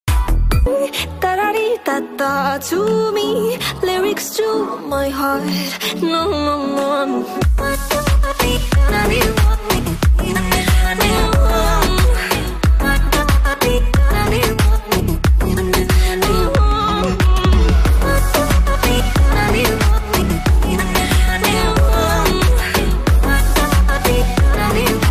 attitude ringtone arabic stylish ringtone hiphop ringtone